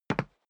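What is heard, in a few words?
step boots wood parquet single right, footsteps; schritte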